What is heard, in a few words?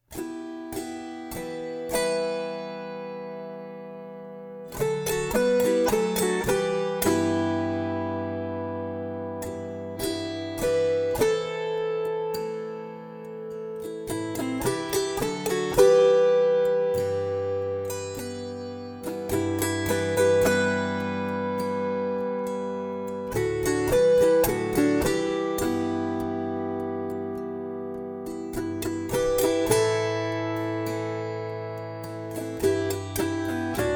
autoharp